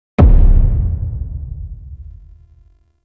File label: Brimstone Interrupt Land; Roathe; Warframe; Tau; Abilities